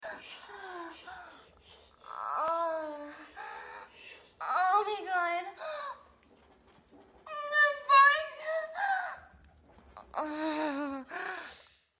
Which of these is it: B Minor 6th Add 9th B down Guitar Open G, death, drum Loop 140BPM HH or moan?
moan